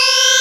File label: Synths Root C